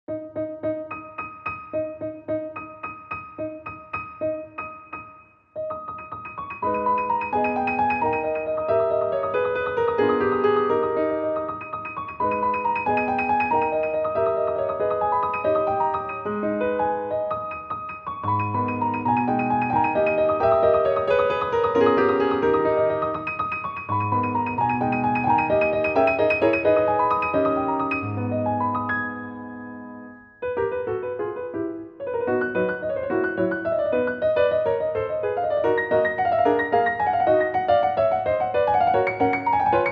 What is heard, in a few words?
lacampanella piano; liszt